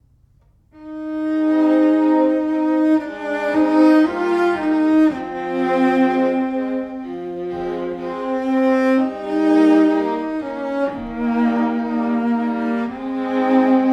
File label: Adagio String Quartet; Opus 2 no 1 Hyacinthe Jadin